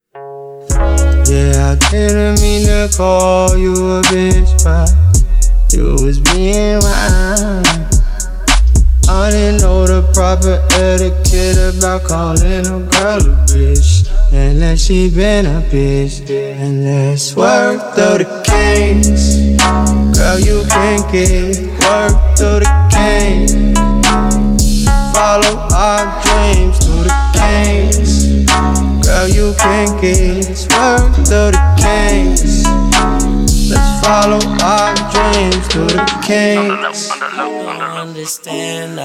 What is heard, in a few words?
HIP HOP